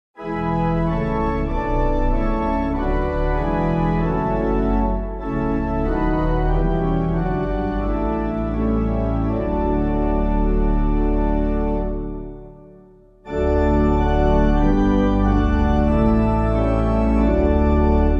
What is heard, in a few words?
Gentle Star of Ocean, organ, hymn; Caswall; SAINT MARTIN; Clyde McLennan; Ave maris stella